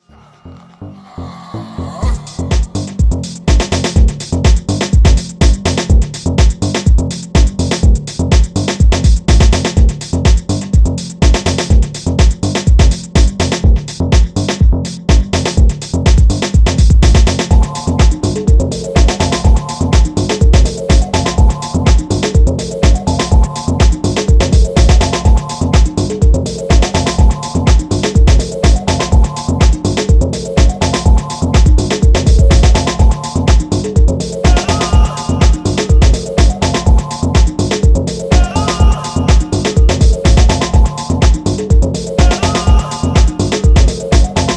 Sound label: the groove